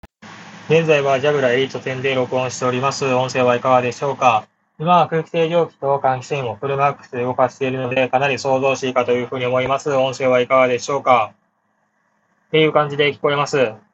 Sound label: Jabra Elite 10 noisy